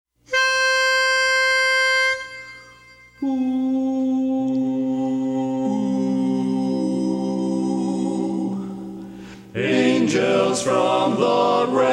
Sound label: baritone, A Capella; angels from the realms of glory